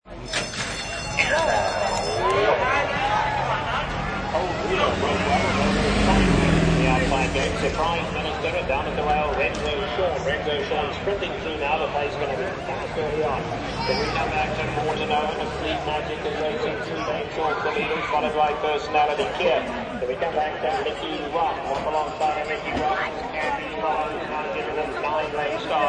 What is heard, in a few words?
Race Amb